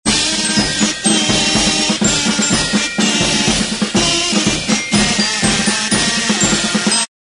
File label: comedy kazoo marching bands